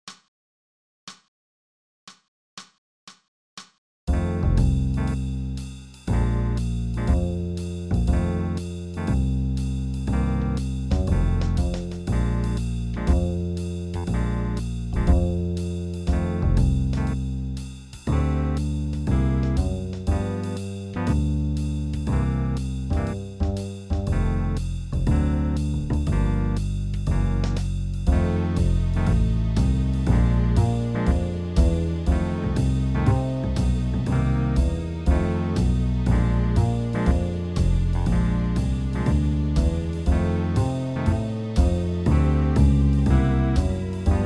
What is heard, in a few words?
Blues Piano